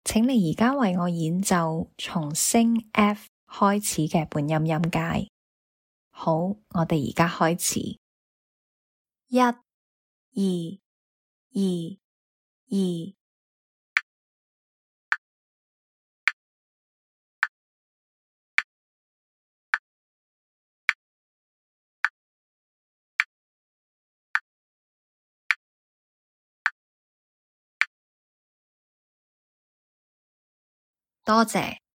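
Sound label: chromatic scale starting F sharp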